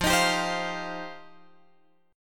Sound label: F 7th Suspended 2nd F down Guitar Open F; F7sus2; strummed